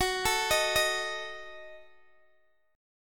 Gb Minor 6th, Guitar Open D

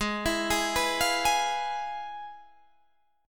F Minor Major 7th Flat 5th, Ab

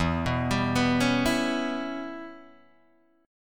E Minor 6th guitar chord, Open G

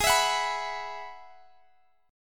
Ab Minor 7th Sharp 5th Ab, Guitar Half Step Down

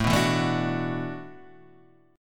E 7th Suspended 2nd Suspended 4th, A

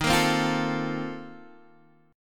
Bb Major 7th Suspended 2nd Suspended 4th

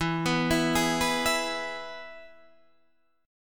E-Major E, Guitar Half Step Down

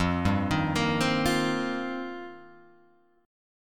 F 7th Suspended 2nd Suspended 4th, Guitar Open G

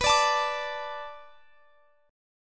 B Minor 7th B Guitar Drop D, chord

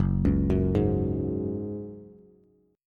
Gb Suspended 2nd, Bass; arpeggiated; 2,4,4,1

